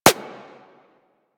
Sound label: Bullet Impact Generic; Surface